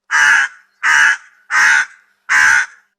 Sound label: Crow Caw; Horror / suspense